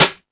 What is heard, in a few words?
Jazz Drums, E-MU Pro-Cussion; drum machine